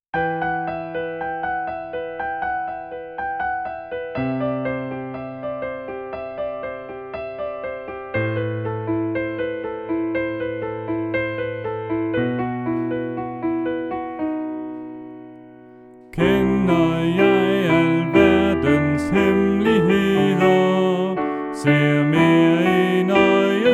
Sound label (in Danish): Sopran; oevefiler